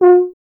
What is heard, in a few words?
BRS TUBA, Solo Instruments; Miroslav Vitous; Solo Instruments VOL-2